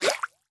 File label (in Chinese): 美西螈攻击声